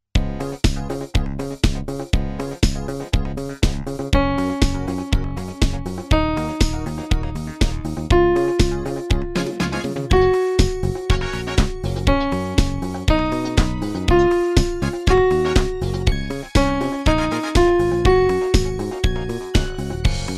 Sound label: Scale Up Eb-Ab